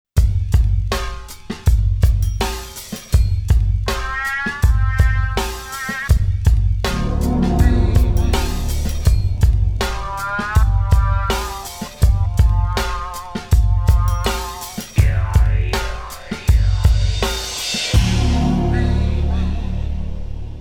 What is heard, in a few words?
hiphop; Multi-patch demo 4; xp-80; roland demo cd